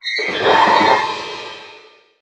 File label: Hit; Left 4 Dead 2008 pc